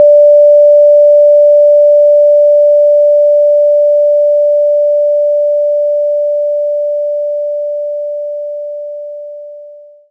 sinewave D5

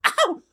death hit; Collector

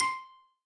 Combo Sound; Candy Crush Soda

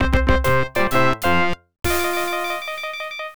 Dominoes Win; mario s game gallery dos

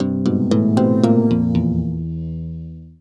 Tombstone Melody; Haven Forest

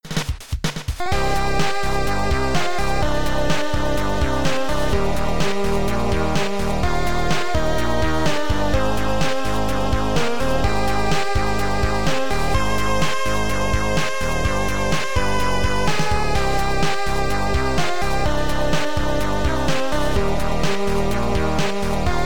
New high score; Lunar Jetman Remake; macOS Windows gamerip 2003

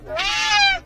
Cat screech; friday the 13th killer puzzle

